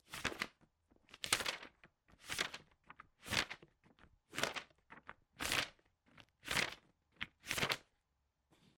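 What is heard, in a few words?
page turning sound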